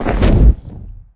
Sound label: Orb Spawn; children of morta; Scorpion King